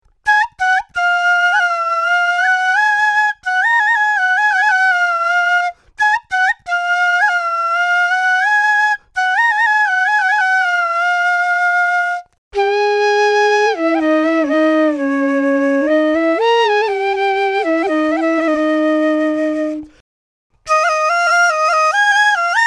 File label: flute